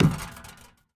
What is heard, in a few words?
Street Ball Acrylic Back, Street Basketball